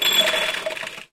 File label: metal pieces glass jar